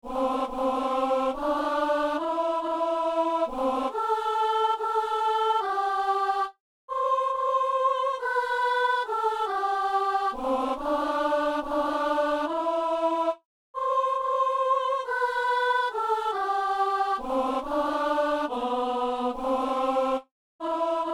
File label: soprano; Messe du 17 janvier à Saint-Denis